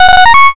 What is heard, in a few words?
fanfare